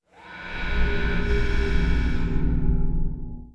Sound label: Clan, ambience